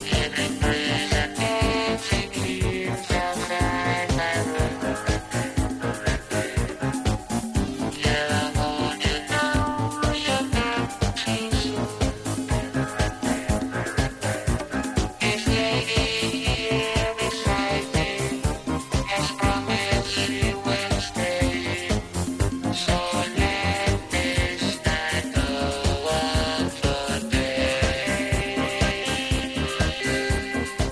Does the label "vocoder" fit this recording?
Yes